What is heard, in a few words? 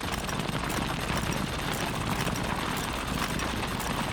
burner mining drill; factorio 2.0.55